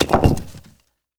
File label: Comic drop; MOBICLIC 14; europe